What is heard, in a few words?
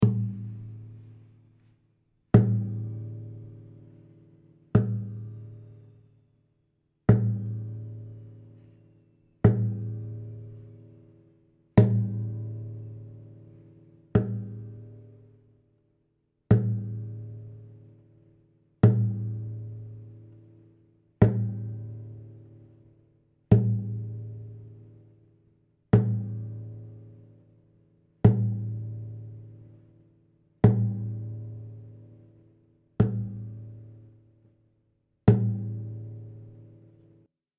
Blues